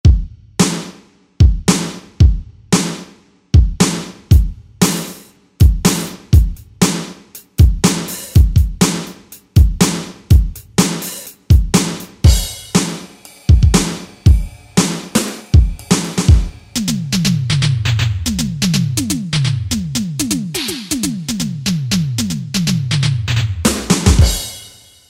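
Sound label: Electric Kit, Yamaha DJX Voices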